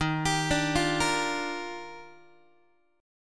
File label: Eb Major 9th, Guitar; EbM9 arpeggiated; x,11,10,12,9,9; JGuitar